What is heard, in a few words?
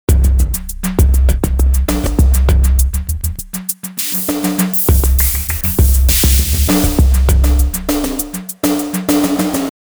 x loop mod; sugar bytes; effectrix